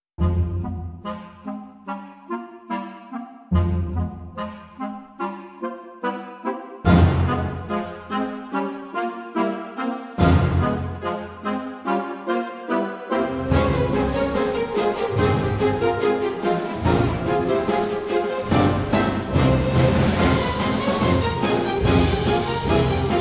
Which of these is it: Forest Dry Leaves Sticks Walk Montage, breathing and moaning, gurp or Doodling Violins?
Doodling Violins